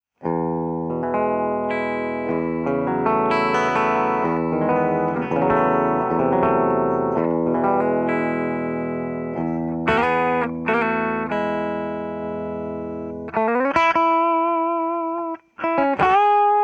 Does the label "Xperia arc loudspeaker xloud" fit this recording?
No